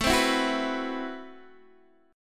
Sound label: A Minor 6th Add 9th A Guitar Open D